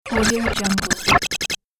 ready glitch

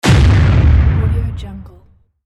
explosion

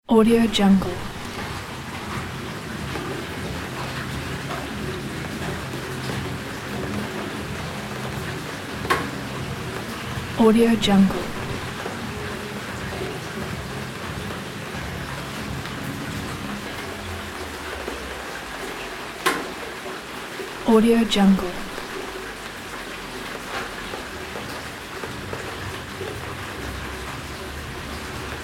rain ambience

